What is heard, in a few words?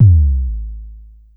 Drum X Sub, Pearl Drum-X